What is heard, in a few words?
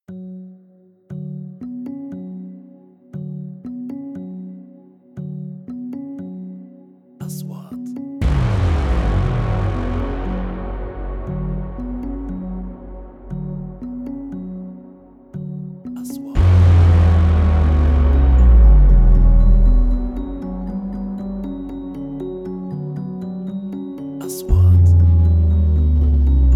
Triler Intro; مقدمات تشويقية